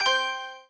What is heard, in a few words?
Bet Down; Temple of Luxor; genesis